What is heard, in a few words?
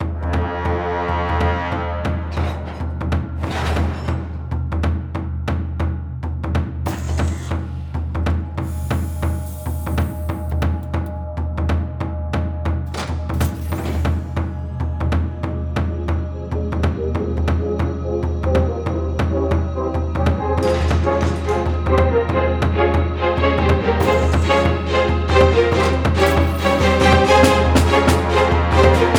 DUBSTEP DRUM